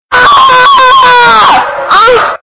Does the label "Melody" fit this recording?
No